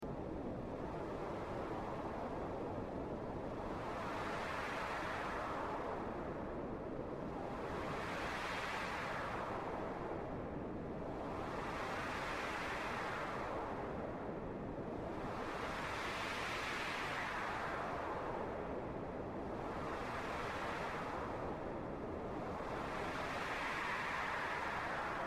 The haunting winds of the desert planet of Dunacia; Vela